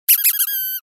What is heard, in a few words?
Toy